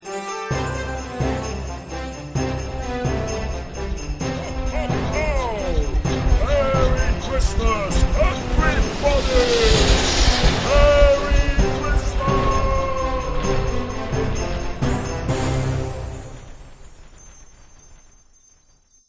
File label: Santa On Sleigh Musical Indent, Dramatic Christmas orchestral music; Dramatic Christmas orchestral music as Santa passes on his sleigh wishing you a merry Christmas; Musical Sound Design